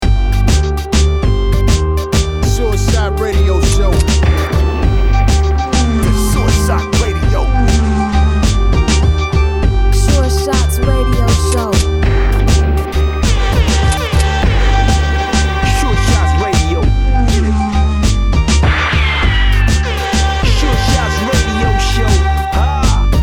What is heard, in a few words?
Ragga